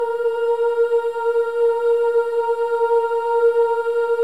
Boys; Spectrasonics; Symphony of Voices

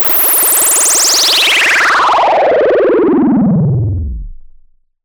MS20 Noisy Whiggler

